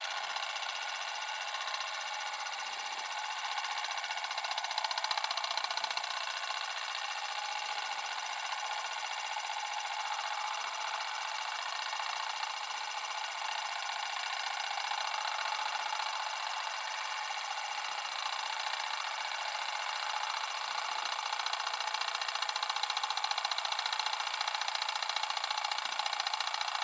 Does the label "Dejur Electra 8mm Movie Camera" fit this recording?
Yes